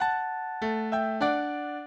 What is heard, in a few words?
minuet, mozart